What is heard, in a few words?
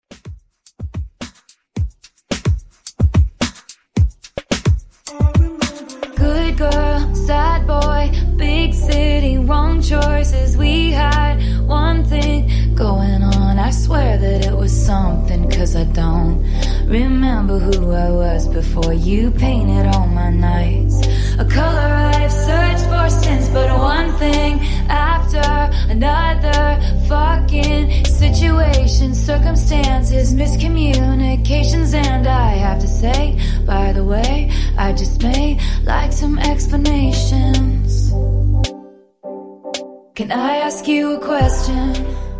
top40 mixshow intro 109 bpm